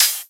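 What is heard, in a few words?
open hi-hat, Drums; HiHats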